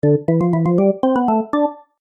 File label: Organ Game Music; Cartoon Music Sound Effects